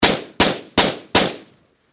Machine Gun 5.6 Caliber Sig Sg 551 Four Shots Distant Perspective; Warfare